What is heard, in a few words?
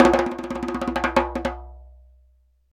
conga licks